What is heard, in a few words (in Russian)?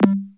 knopka suhaya odinochnii korotkii blizkii